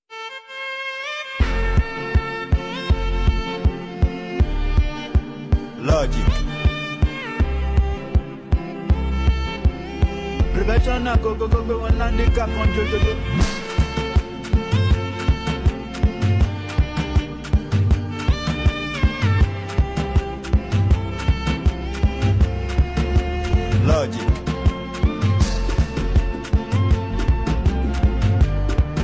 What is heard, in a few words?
Professional Beat Violin